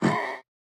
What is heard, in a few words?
Damage; Folk Hero